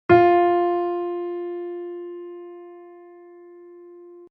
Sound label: Note F Natural